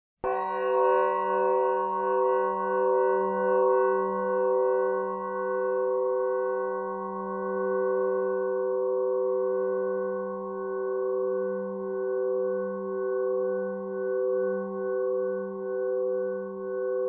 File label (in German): klangpyramide